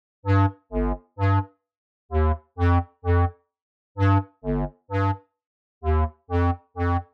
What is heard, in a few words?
Winds; PatchFactory